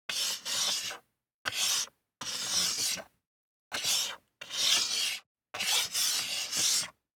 DICE on Plastic, Slide, Standard, 1 One Die; Samples; ArticulatedSounds; DICE Sfx Party (Extended)

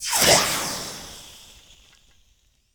Steam Sizzle Hit

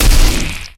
Ranged Magical Heavy; Kilta

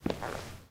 footsteps squeaky; Apotheorasis

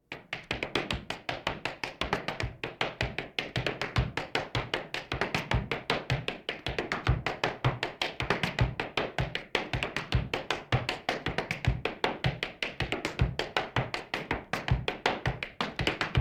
Laugh tracks

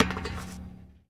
Street Ball Metal Basket

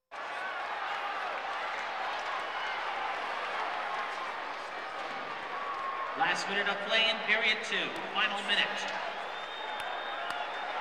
Sports crowds

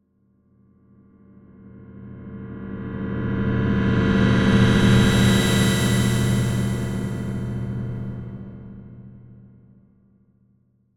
Drone Dark Deep Transition Tone; Hollywood Edge; sound designer's toolkit v2

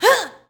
Boxing Female Punch Grunt

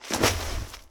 Bodyfall Snow; SFX Kit

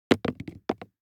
DICE on Plastic, Throw and Roll, Foam, 2 Two Dice; Samples; ArticulatedSounds; DICE Sfx Party (Extended)

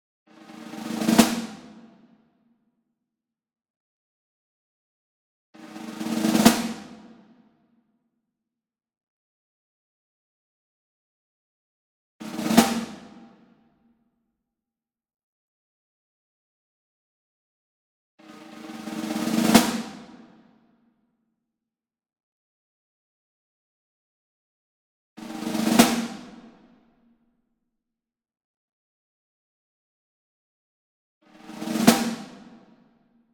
SINGLE SNARE Brady Jarrah Block Roll Medium; boom library; Cinematic Strikes Construction Kit

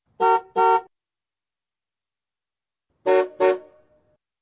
Car Horn x 2; Sound Ideas; Series 2000

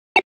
computer beep; NCH Library

